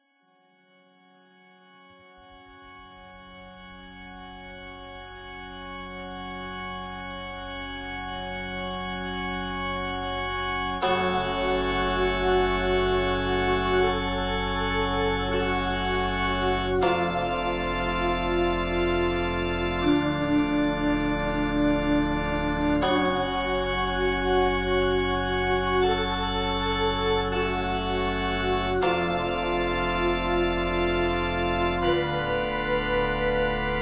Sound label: Ambient Church Spooky